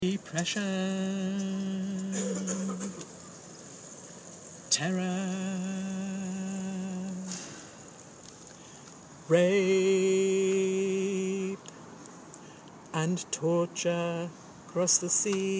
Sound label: tenor